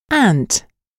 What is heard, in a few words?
ant